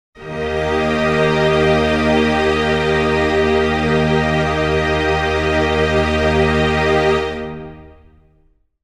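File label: string chord d sharp major, Pads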